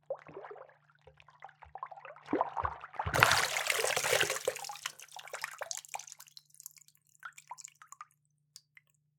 Coming Up From Underwater

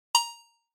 Ding A 5 Note

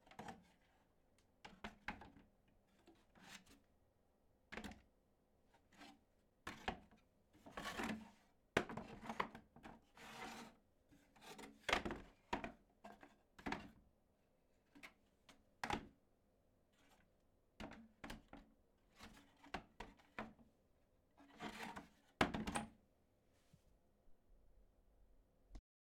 Wooden Crates Shuffling

